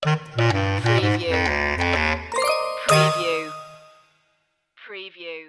sting

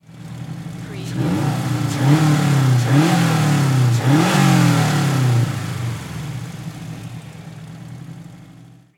SUBARU STI ENGINE TRIPLE REV

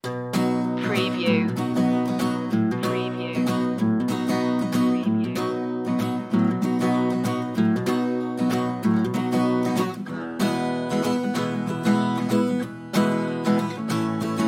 rhinestone rambler acoustic guitar only

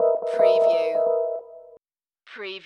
Hip Hop Dark Rhodes Licks 90 BPM Swing - E

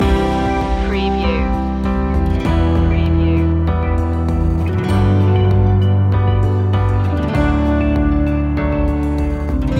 dreamful loop

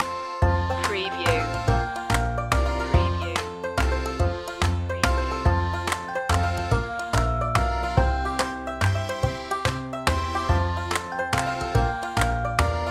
my bonnie lies over the ocean instr loop

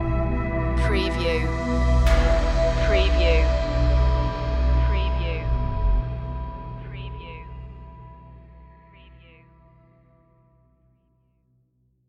forest fantasy stinger

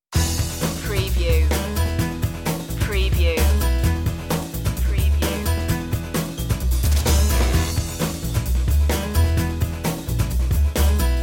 life style tv light comedy

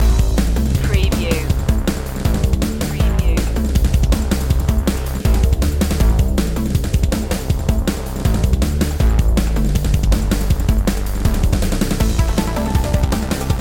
digital ocean long looping

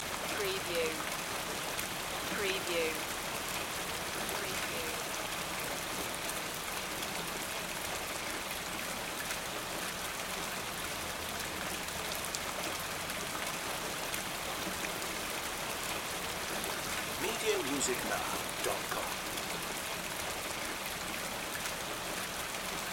Rain House Gutter; Sound Effects Bible

